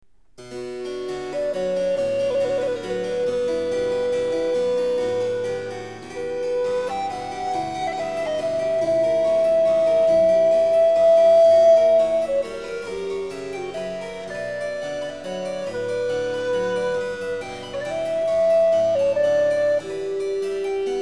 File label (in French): bach violon sonate